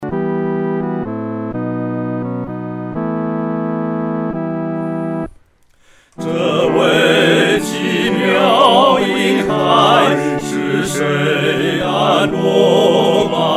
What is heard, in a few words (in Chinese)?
合唱 四声部